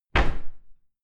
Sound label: cupboard close hard subdued